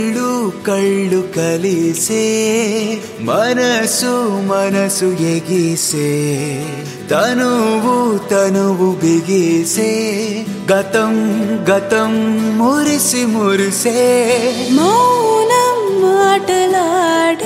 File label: telugu ringtone